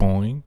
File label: jump; im not as good as supermario